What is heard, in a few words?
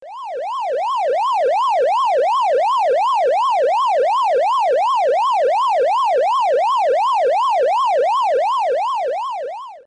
ghost spurt move; pacman